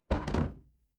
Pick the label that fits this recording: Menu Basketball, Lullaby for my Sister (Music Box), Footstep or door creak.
door creak